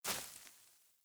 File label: Sandals Land Vegetation; Characters; Player; Footsteps